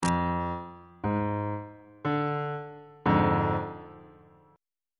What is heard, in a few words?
c double sharp diminished 1st inversion on bass clef